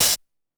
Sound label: 999 Hat Open; Concrete Collage Drum Kit; Concrete-999